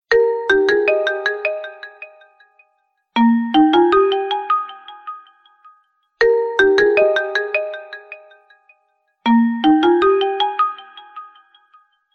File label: huawei old phone ringtone